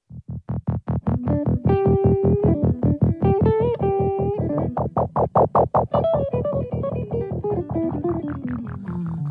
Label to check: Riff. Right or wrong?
Right